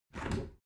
window open heavy; objekte; fenster

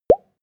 pop bubbles deep; soundpool

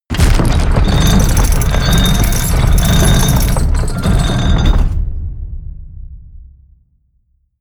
portcullis open

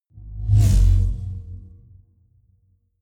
sci-fi vehicle train drive by hum fast; raumschiff

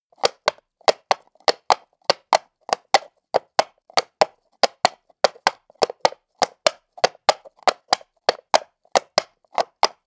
horse hooves clack walk asphalt; natur-tiere; pferde

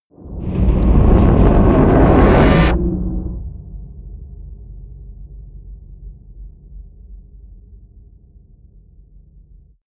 eerie industrial swell; whooshes rises

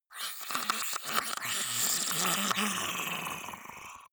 monster choking zombie idle high; comedy-cartoon; soundpool

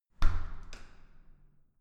entrance door close soft

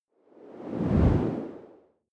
whoosh simple long; swooshes-swishes

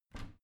bathroom cabinet both open heavy; objekte; tueren

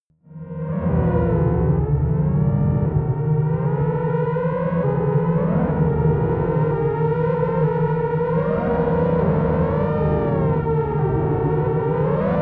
humming drone, drones

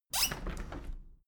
window handle plastic squeak open soft; objekte; fenster

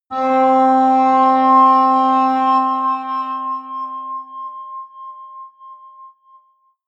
atmospheric feedback synthesizer high, drones

